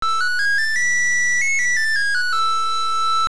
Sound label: Ocarina Frog Song